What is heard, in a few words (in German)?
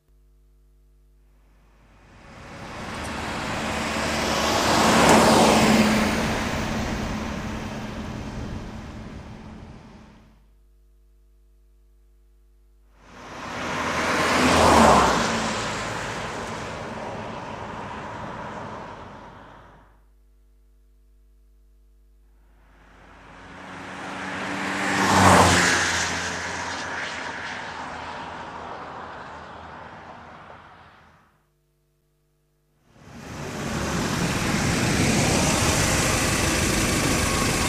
Auto vorbei, Verkehr